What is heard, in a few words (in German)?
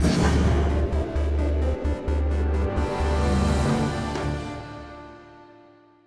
menu siegesmusik; magic 2014